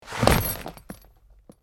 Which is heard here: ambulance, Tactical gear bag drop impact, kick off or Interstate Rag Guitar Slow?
Tactical gear bag drop impact